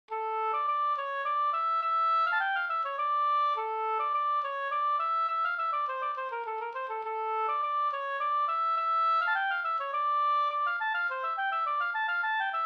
slangpolska

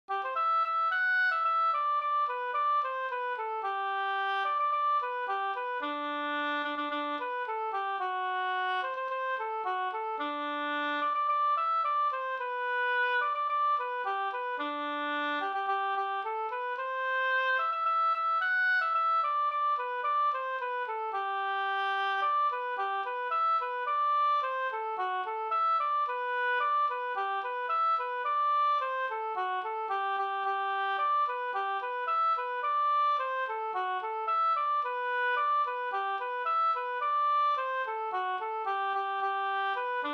folkdans, dk; Fyrtur fr Hjorring

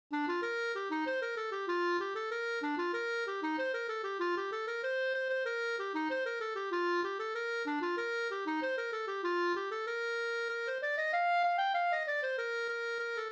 anglas

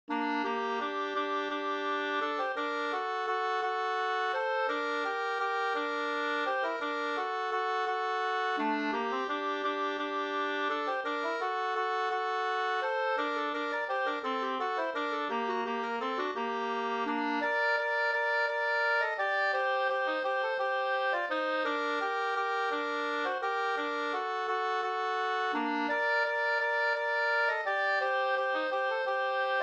Begravningsvals

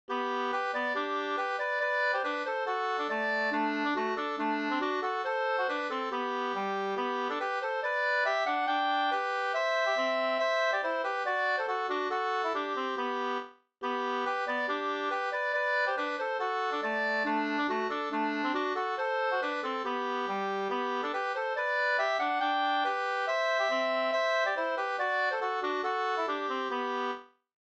schottis 4-4; Kaakuri LouisM55p28 V1 2st

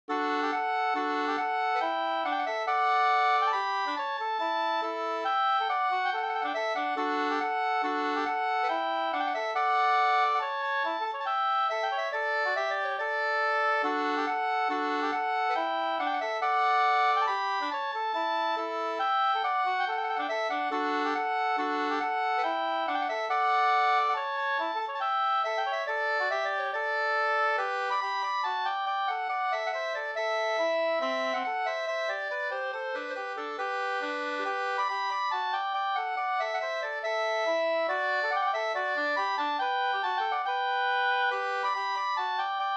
schottis; Spak Erik; Sarna

